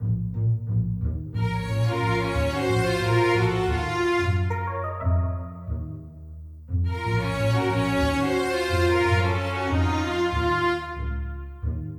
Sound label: Menu Music; yo frankie